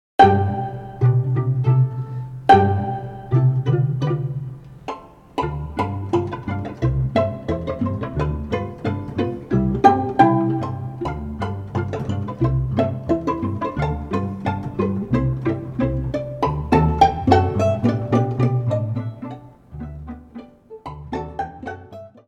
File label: pizz